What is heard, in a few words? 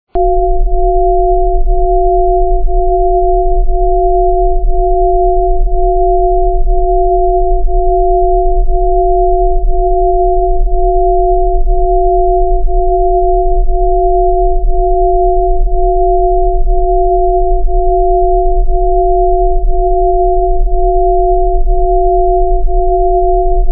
third eye tone, Solfeggio tone; Certain tones and frequencies have been used to balance and bring harmony to our lives.